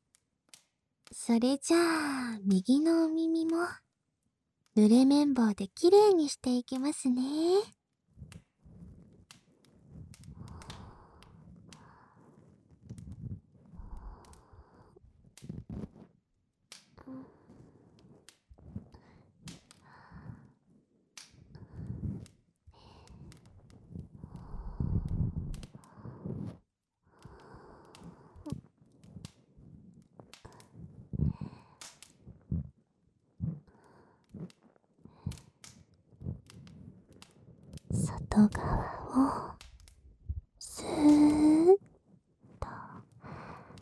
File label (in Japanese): 濡れ 綿棒 で すっきり（右耳）